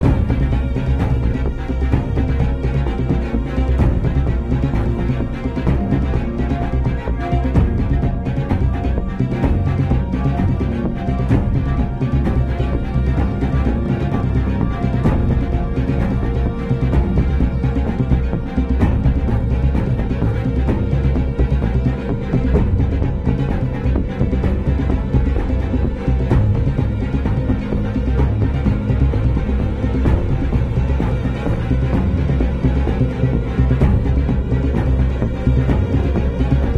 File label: loop Tense